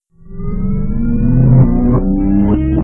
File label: Windows Shutdown; RSOD; Windows Never Released Soundpack 1; deviantart